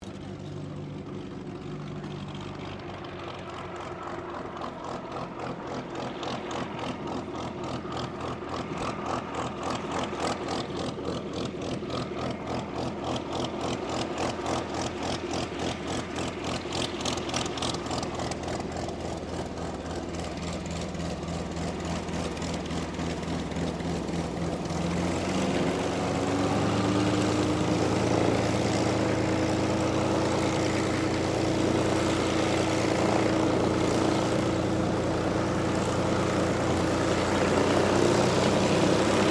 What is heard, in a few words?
Aircraft P-40 Kittyhawk taxi idle, WW2 Kittyhawk or Warhawk fighter plane; 1940s; Airplanes